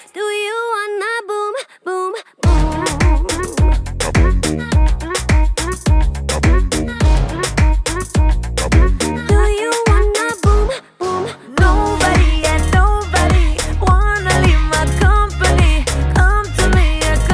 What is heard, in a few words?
Bgm ringtone